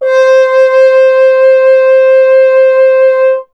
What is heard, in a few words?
F. Horn; Miroslav Vitous; Solo Instruments VOL-2